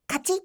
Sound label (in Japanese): kachi